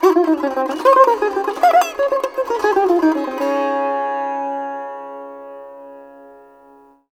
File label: SITAR; Spectrasonics; Heart of Asia